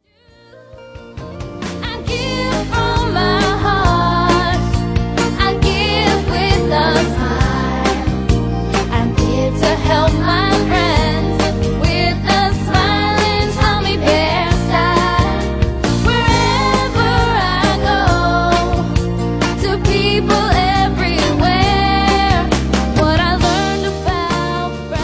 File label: jingle